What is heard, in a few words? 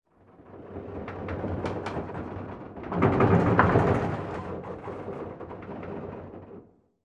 ac130 rattles; amb elements; modern warfare 2